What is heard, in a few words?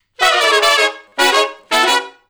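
Funk Riff (A) harmony, Horncraft for R&B Sections; Crimson Blue and Fabulous Horncraft 4 RnB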